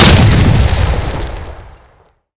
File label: explode